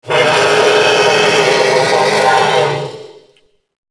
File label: wretch tunnels chase init; A Machine for Pigs